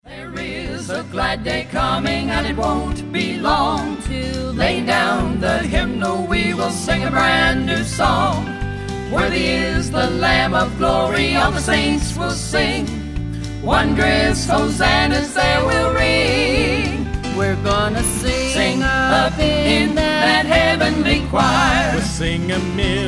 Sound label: heavenly choir